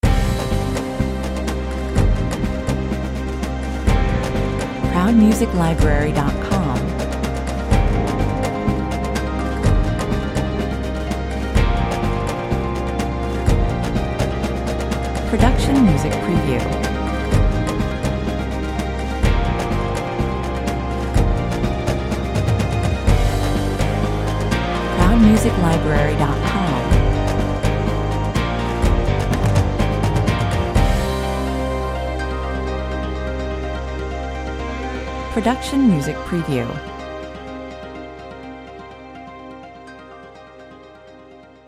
125 bpm, Electric guitars and exotic percussion fused with traditional orchestral sounds; dark, intense mood of heightened suspense; Electric guitars and exotic percussion fused with traditional orchestral sounds evoke a dark, intense mood of heightened suspense